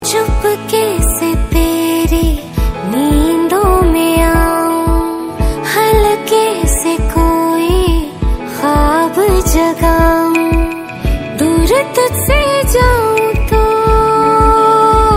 Female Version

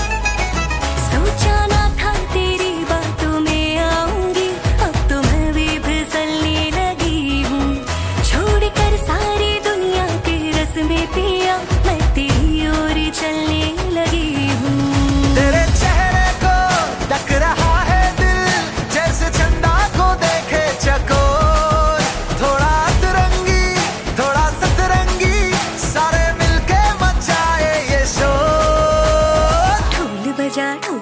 female version

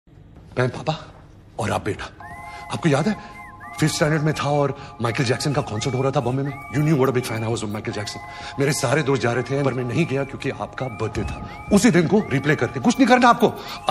BGM; Animal movie; Ranbir Kapoor

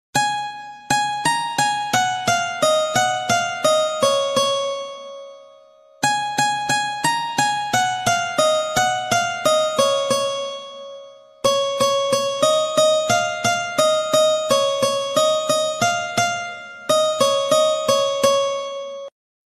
Instrumental Piano Song

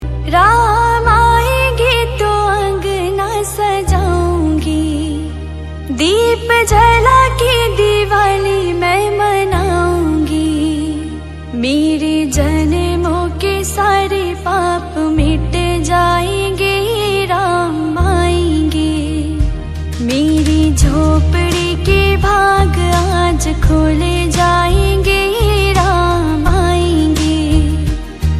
Female version

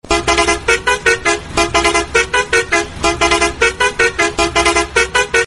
SIMULATOR BUS HORN

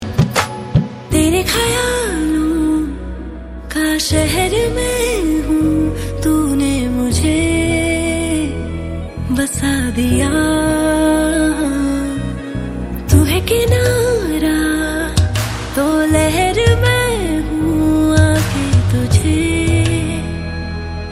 Female version

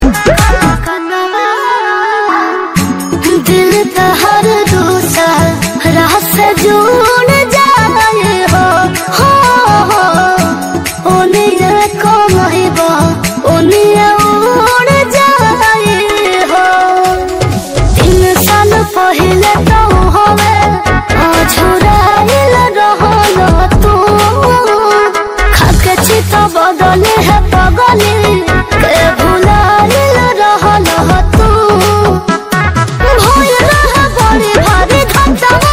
sed song

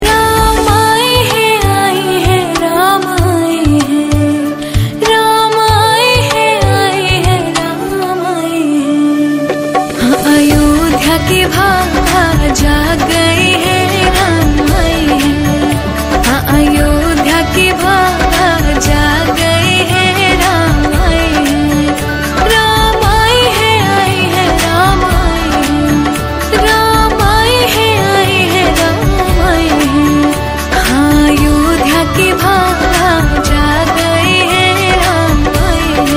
Ayodhya Ram Mandir Song

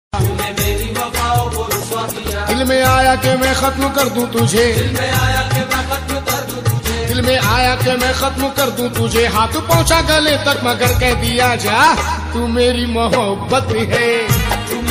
sad song

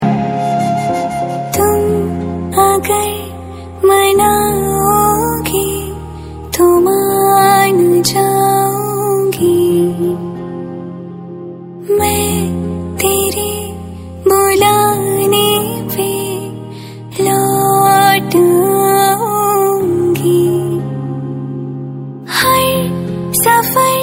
female version

